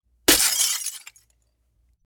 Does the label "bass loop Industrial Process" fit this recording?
No